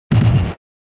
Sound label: SNES Fighting Fall